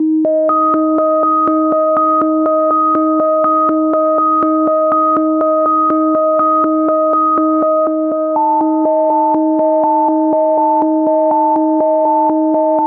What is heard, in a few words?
122 BPM Melodic Arps D maj B min Tonic C; MMB